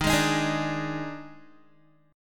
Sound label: Eb Major 7th Suspended 4th Eb, Guitar; EbM7sus4 strummed